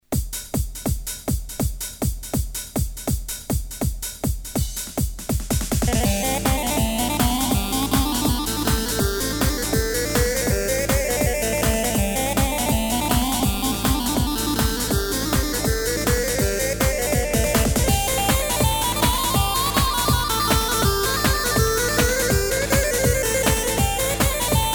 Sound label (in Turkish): gw8 techno karadeniz